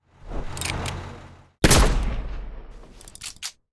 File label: Normal Attack